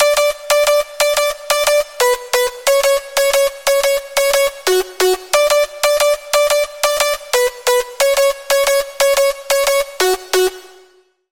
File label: Lead, Sylenth1; Lead - Galactic Vision; Routed Sound; Sylenth1 Expansion - Star Scavenger Vol.1